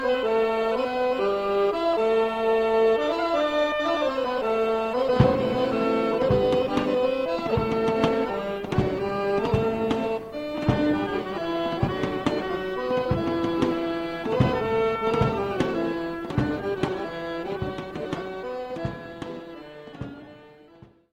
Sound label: Horo; Bulgarie